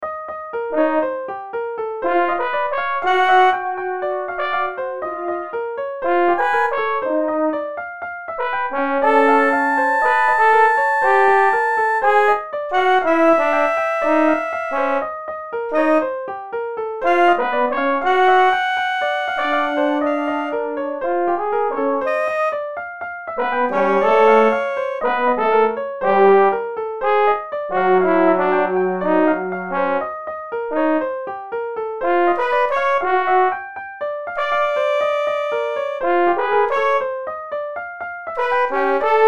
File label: Trumpet Horn SOPRANO